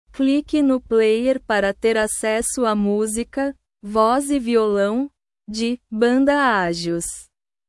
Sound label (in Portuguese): voz e violao